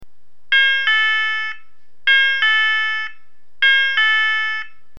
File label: CHIME REPEATING 554-440HZ